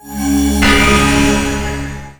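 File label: Item Character Exchange; Fly For Insanity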